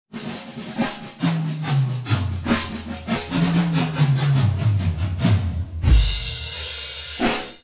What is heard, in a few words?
drumsolo